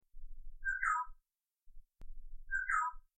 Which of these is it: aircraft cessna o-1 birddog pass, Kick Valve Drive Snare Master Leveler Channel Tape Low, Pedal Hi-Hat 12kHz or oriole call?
oriole call